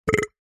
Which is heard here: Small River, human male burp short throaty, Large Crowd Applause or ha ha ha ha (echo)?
human male burp short throaty